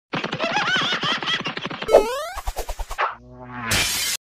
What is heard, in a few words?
logo; nextworks